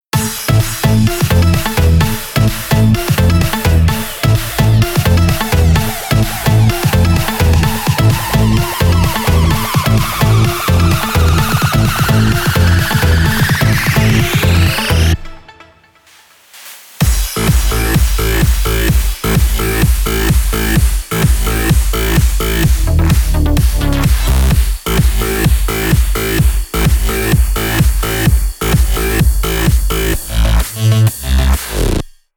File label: Hard Remix